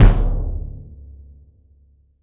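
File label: Concert BD, bass drum; Roland BK-5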